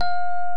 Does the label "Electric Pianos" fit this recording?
Yes